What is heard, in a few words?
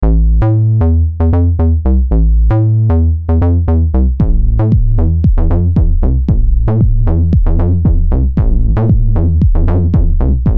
Anti PWM LFO